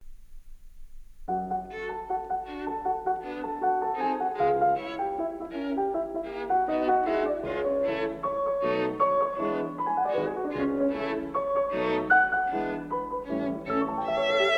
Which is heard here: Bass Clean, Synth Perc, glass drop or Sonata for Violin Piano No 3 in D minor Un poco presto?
Sonata for Violin Piano No 3 in D minor Un poco presto